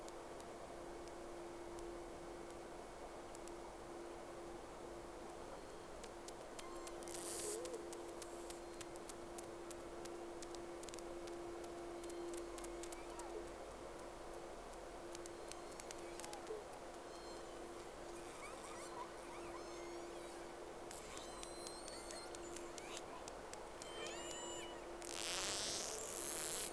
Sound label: Whales; SeaSnake